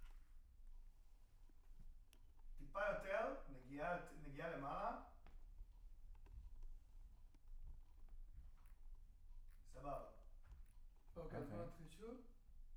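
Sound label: guitar Mic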